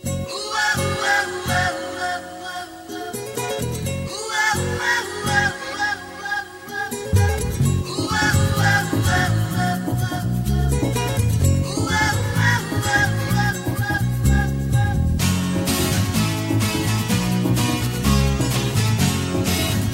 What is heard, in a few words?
guitar ringtones